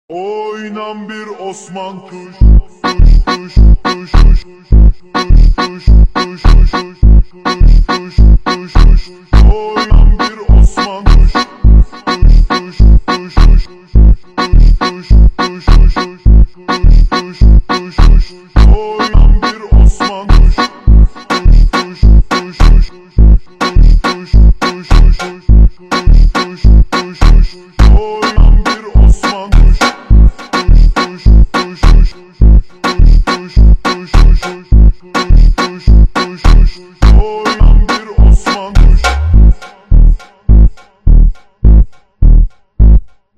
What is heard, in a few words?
Funk slowed